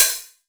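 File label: open hi-hat, goa kit; Wizoo - Platinum 24 Electronic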